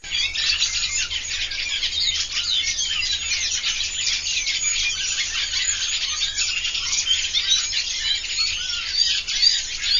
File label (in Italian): Sassellara